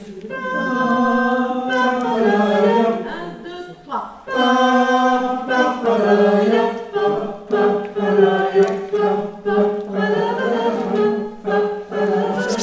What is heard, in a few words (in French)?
pa pa pa da iap hommes, chorale